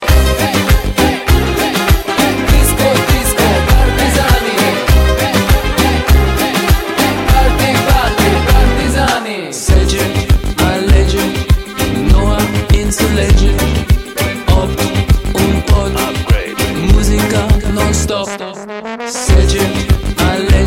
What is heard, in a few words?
disco disco ringtone